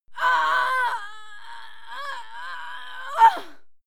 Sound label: female die moan heavy; schreie; menschen